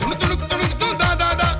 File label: incorrect